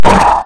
bump; opentdm; cobra

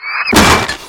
fall; opentdm; bart

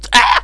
bump; potator

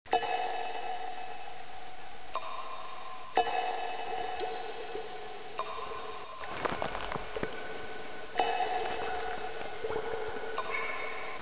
cave mix

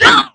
pain; megaman

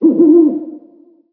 owl

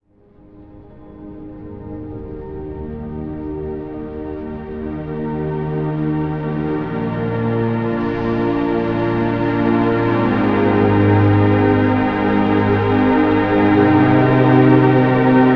music; vortex; spawngirlsounds

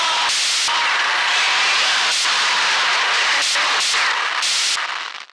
radio

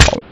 fall; vortex

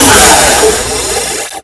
death; walker

